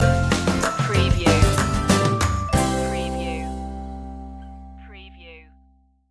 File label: put a smile on stinger